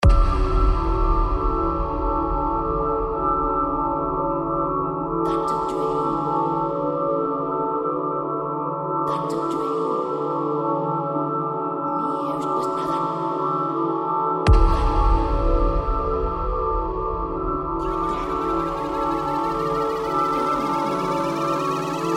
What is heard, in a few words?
432HZ DUBSTEP